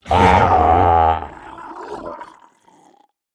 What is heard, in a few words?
death, procoptodon; zoochosis pack